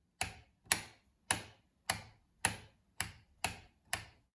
shelchki vykliuchatelia

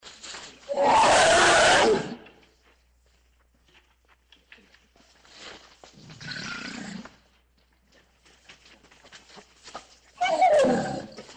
zvuk slona

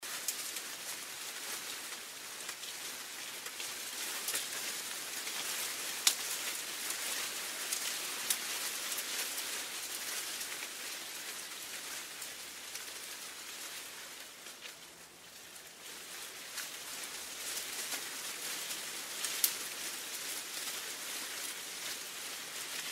shelest suhih listiev

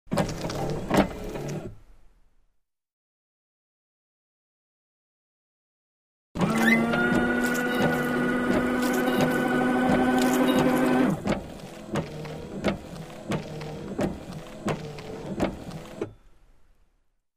Avto dvorniki zvuk podachi vody

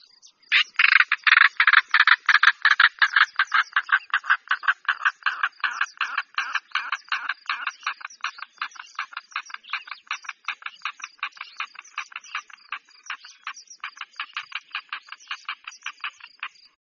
drofa

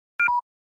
razriajennaia batar; huawei

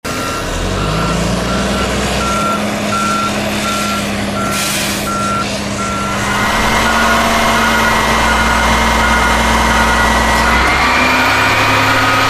musorovoz

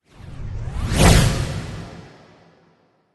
zvuk poiavleniya ili ischezaniya c ekrana kadra

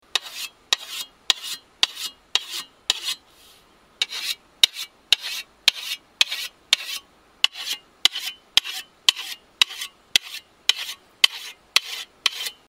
tochat kosu

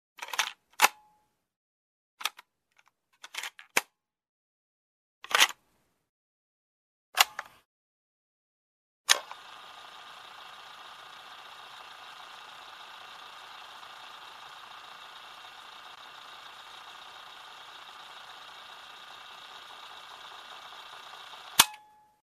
zvuk kassety magnitofona

